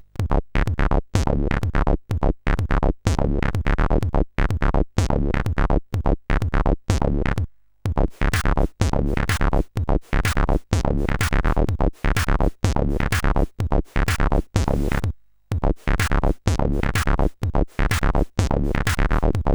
sid, CSG8580; hvsc

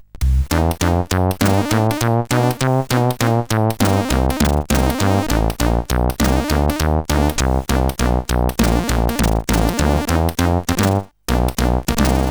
Jazz, CSG8580R5

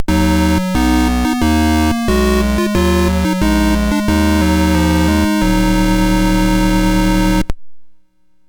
sid MOS6581R2; Treasure Island

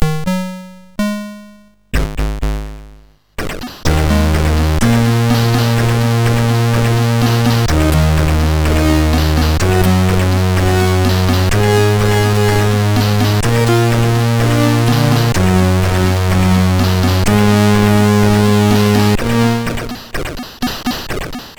sid, MOS6581R3; Tenebrous Party

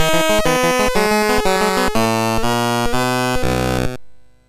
Moon Hop, sid, MOS6581R3

